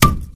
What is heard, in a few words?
wood box impact bullet; Source Engine; video game; physics